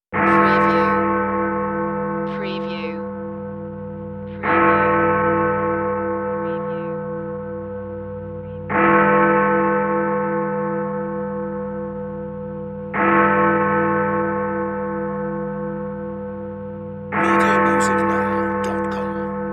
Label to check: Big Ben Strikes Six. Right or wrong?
Right